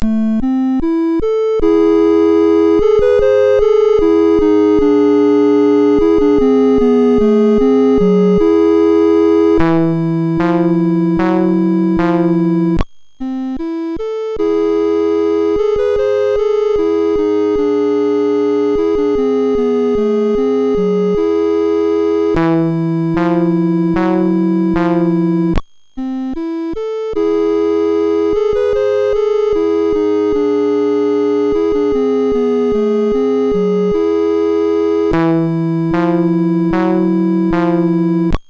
8Bit Goblin, MOS6581R4; hvsc

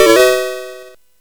sid CSG8580R5, GAMES; Cavelon

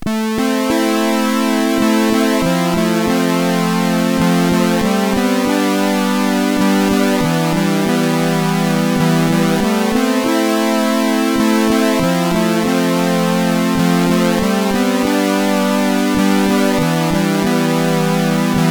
Intro, sid CSG8580R5; Lethargy

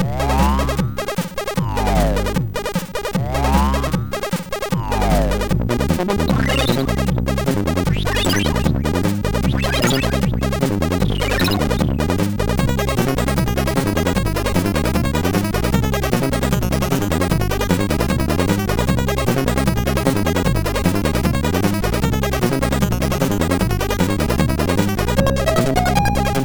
sid MOS6581R4

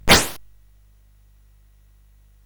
Paddle Mania, CSG8580R5

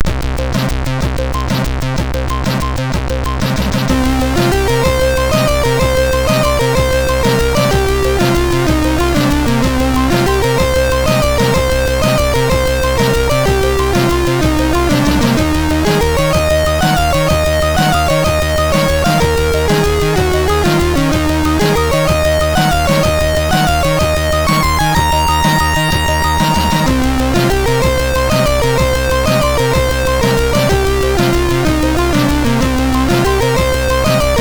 Remix, MOS6581R3

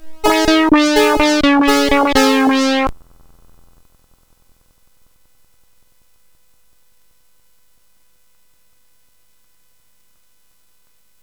Sweep, CSG8580R5

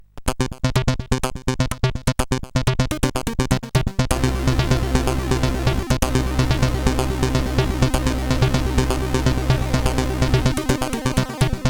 Hardcore, sid CSG8580R5

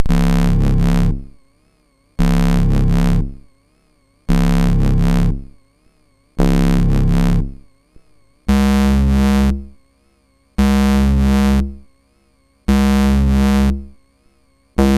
Synth Samples Bass, MOS6581R4; Bass 2

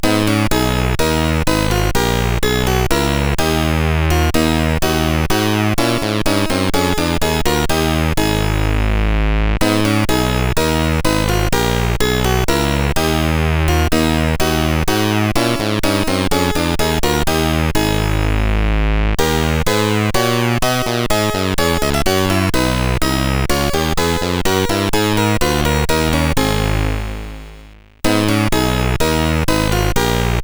Bach CSG8580R5

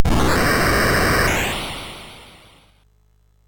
Dragons Den, MOS6581R3; sid

